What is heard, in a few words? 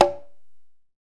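perc quinto, deep congas; Roland LCDP14 Africa VOL-2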